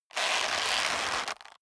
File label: leather creak short hard